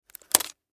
Wire Cutter Cut Snip Cable Tie; Hand Tool Sound Effects; Blastwave

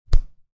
A punch.; Punches and Hits; Combat; SFXsource

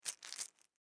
Foley Medicine Bottle Shake Movement Small; Miscellaneous Household

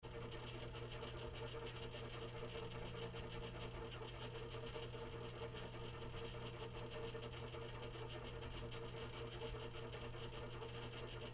Room Tone Basement Utility Room Mechanical Pulse; Big Room Sound

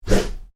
Swish: Short, Slow, Whoosh; Whooshes; Sound Design; Stuart Duffield

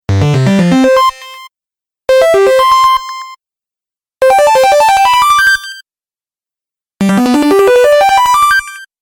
Game Positive Win Award Collection, video game

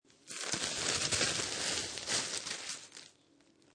Plastic Bag Open Crunch Crumble; Materials

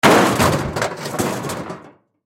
Crash Car Hood Object Drop; Crashes (Sound Effects:Objects); Blastwave

